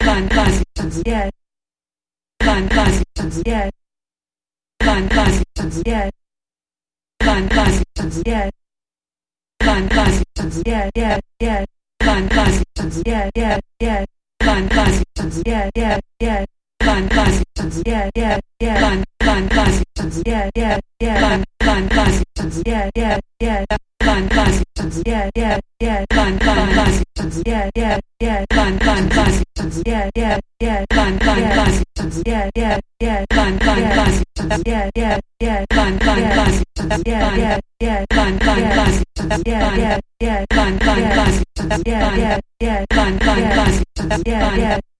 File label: Sampled Voice Percussion Variation